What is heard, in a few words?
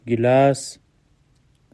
glass